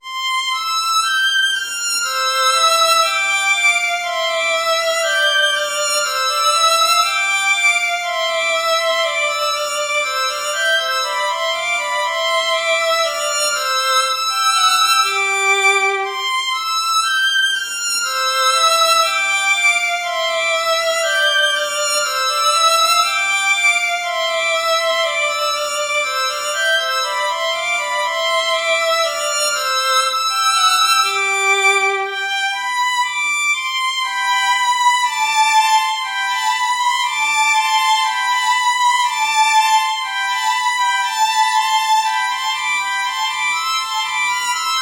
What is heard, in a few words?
allegro moderato; hook james